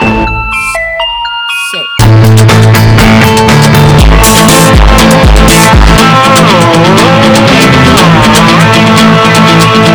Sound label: gamemusic